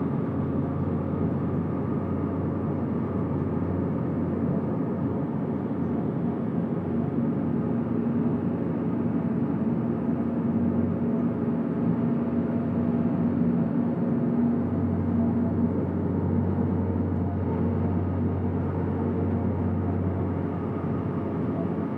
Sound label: spaceport hum idle; ambient; Stellaris